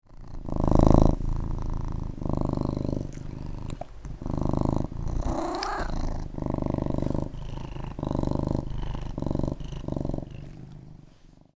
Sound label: purring